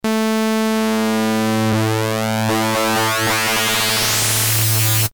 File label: fm up